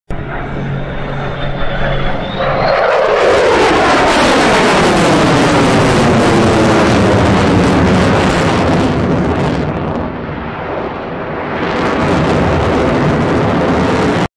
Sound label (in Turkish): f 35 savas ucagi manevralari ve sesi